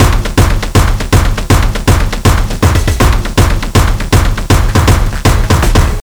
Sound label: Beats Loop; Anger Foot